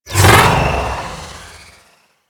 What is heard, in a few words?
Well Of Life Activate; Warframe; Warframes; Trinity